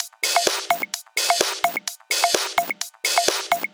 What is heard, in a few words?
House Loop, 128 BPM; Vengeance Samples